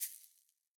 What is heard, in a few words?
shaker single hit; Raw Perc One Shots; Slate; SD SEISMIC Drum Sample Pack